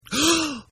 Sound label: Gasp